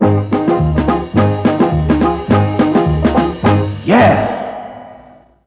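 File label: G1 dixie trombone, Mellotron; Mike Pinder - Mellotron Archive CD; DIXIE+TRMBN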